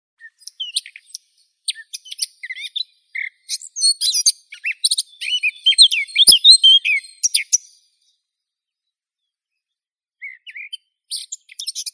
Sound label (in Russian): slavka chernogolovka sylvia atricapilla, zvuki prirodi